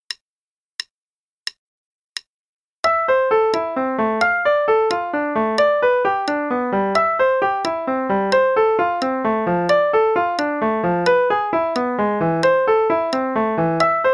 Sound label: Play-along Petite Prelude, dq=88; Schytte